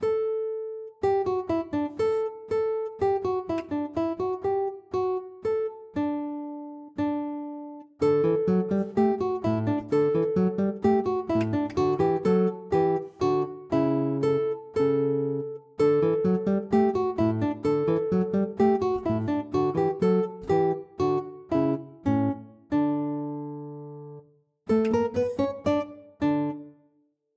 Guitar

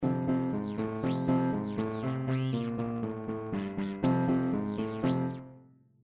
Cimbalon Blues

